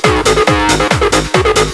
techno

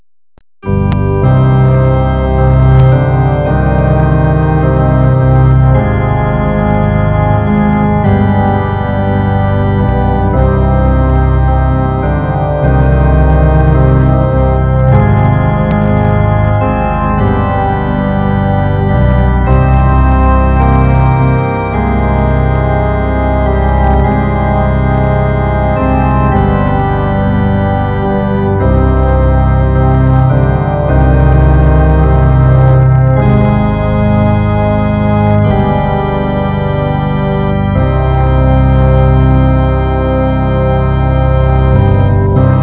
organ

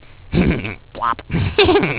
Plop

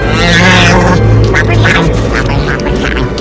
tauntaun

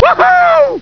woohoo

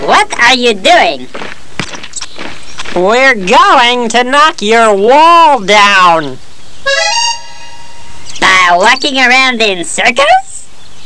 knock

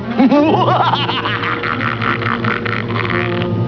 laugh

